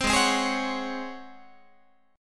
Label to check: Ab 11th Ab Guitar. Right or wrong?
Right